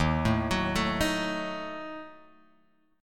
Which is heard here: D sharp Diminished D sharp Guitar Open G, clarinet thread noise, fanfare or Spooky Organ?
D sharp Diminished D sharp Guitar Open G